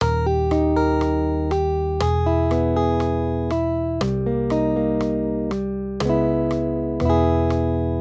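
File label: Accompaniment, Major Triads, Eb-E, 120 BPM, guitar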